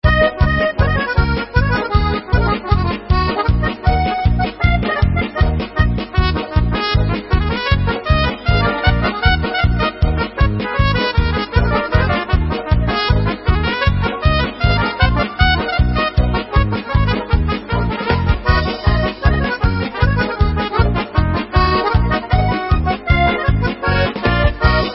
Hora, Instrumental

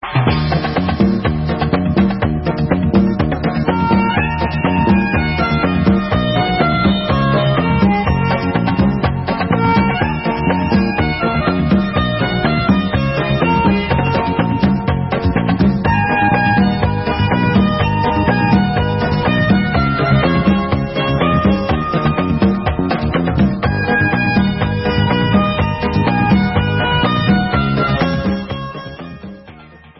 Instrumental

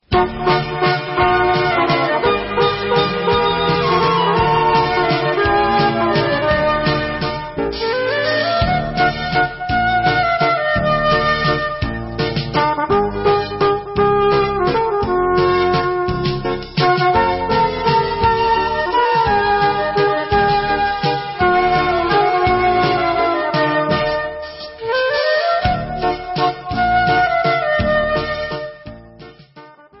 Vals, Instrumental

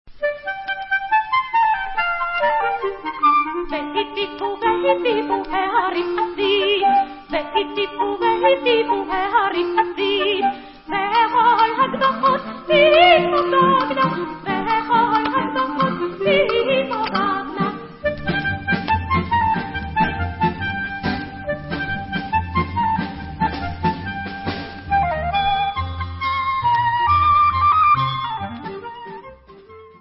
Vocal

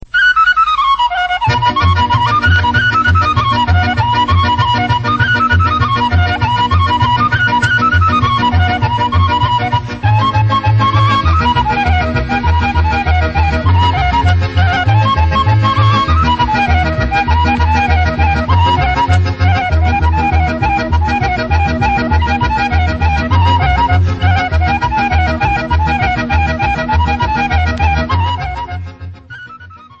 Homolyanka Kolo, Folk, Instrumental

